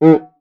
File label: QUICA, Percussion; Voice Crystal - Steve Reid Definitive Percussion